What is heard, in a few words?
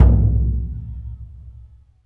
Burundi dry, Heart of Africa; Spectrasonics